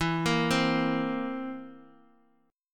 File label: Bb Suspended 2nd Flat 5th